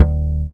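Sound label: bass guitar C#3, jazz bass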